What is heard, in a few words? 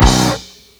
alert ugly thud